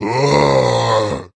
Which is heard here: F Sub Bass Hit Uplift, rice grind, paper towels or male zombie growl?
male zombie growl